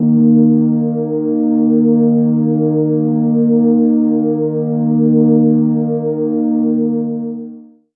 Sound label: pad a sharp3